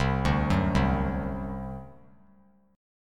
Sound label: C Suspended 2nd Flat 5th C down; Csus2b5 arpeggiated